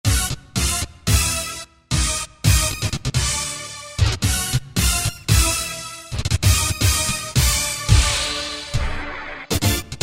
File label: Hit, Yamaha TG500